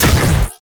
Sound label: Rifle Shot, Weapons; Warframe; Tenno; Gyre